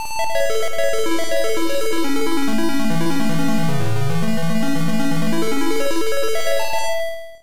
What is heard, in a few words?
Harp; aoki okami to shiroki mejika gencho hishi; pce super cd rom2; Harp of Horde